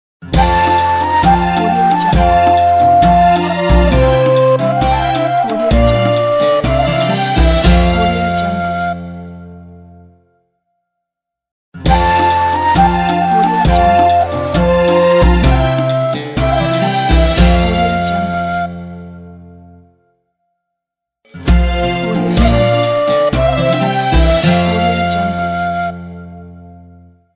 happy ramadan intro logo

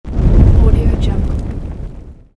fire

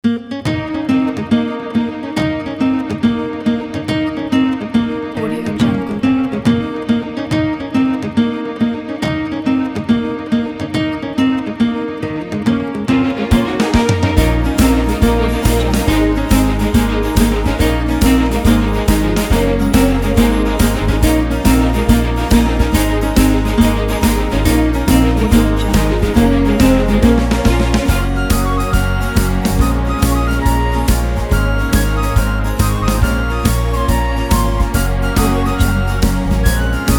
bold warrior celtic orchestral

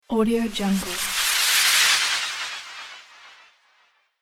magic shimmer spell

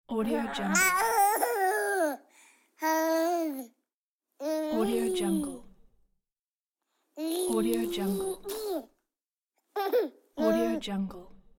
baby laugh

